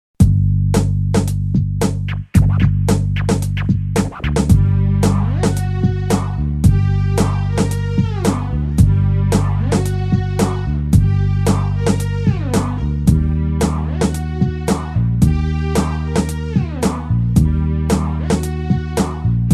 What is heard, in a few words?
horror city